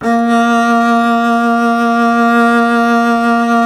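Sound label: string contrabass A; Miroslav Vitous; Solo Instruments VOL-2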